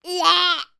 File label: human boy 3 years old british cough forced fake